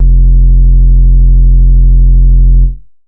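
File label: bass sustain ultra F; Polyend; ARC Noise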